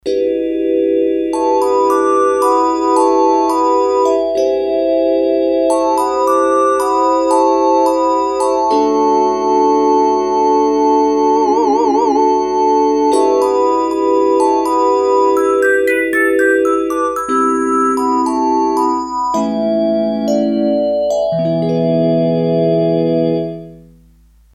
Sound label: Synth, Korg DS-8; Factory Patches